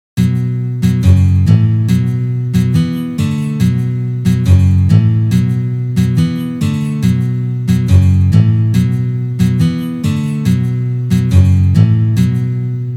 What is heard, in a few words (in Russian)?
Uprazhneniya Rok na gitare